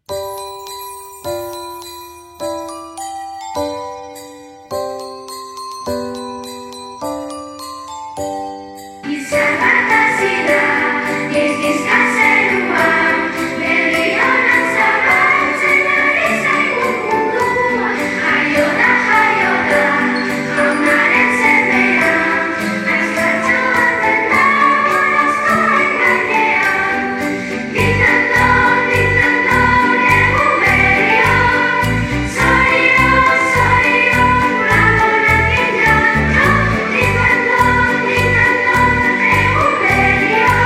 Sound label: JINGLE BELLS INTERNATIONAL